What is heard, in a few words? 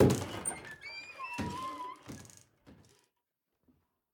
Saloon Door; The Town